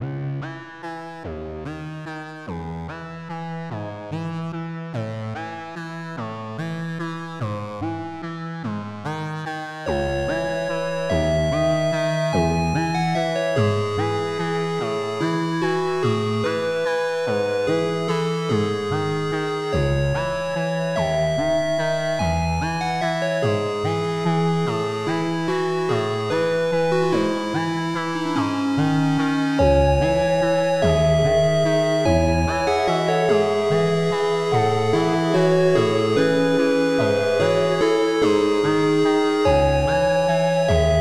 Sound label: bittersweet ending, Inspired by Undertale style; Arold Valda